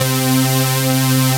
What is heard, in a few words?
BIG POLY SAW, TECHNO; Pro-Rec - Synthsonic; Z1 TECHNO